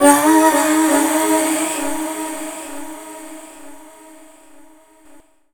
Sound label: Dance Vocals, FEMALE